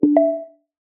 glint; OnePlus OxygenOS

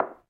Keypress Spacebar; OnePlus 5

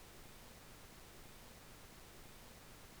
calibration file; Samsung Galaxy S10; DeviceTest

